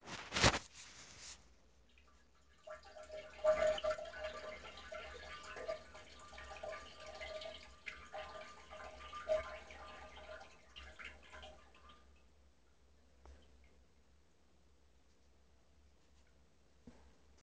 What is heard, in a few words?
peeing